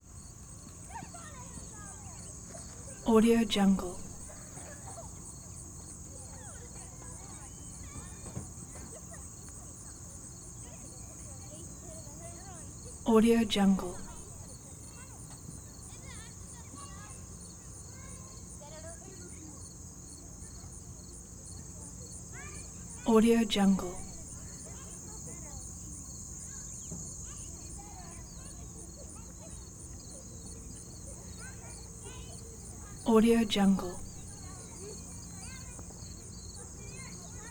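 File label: ambience exterior kids in schoolyard mono mix; collanderson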